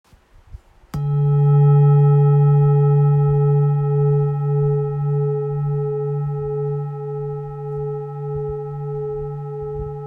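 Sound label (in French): Bol therapeute si, bol chantant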